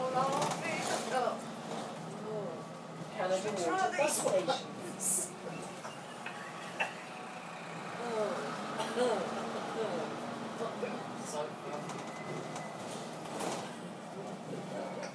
this woman's laughing mixed with some swearing on the bus; made me laugh on the 47 bus